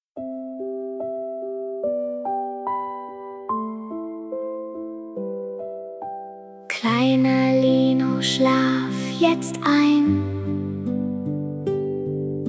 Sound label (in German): schlaflied; Trailer